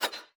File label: damage hits explosions, Warships